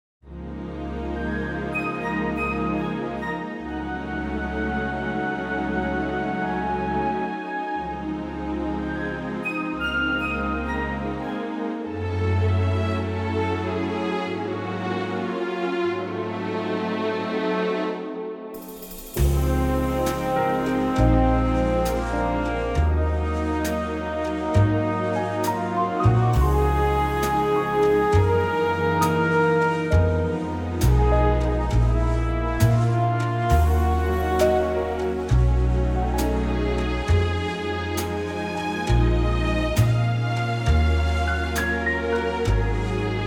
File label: Db orch; key - Db - vocal range - F to A